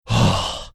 annoyed sigh

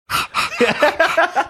doing duck hunt dog laugh

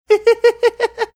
laughing

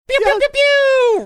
making air horn sounds

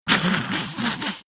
snickering

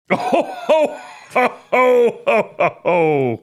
santa like laugh

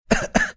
coughing

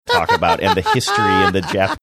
cute laugh

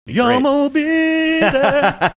singing

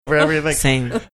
random sing

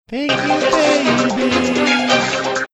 singing thank you baby

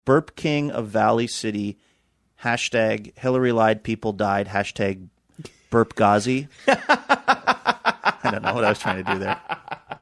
burp

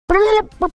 making marsupial gurgle sound

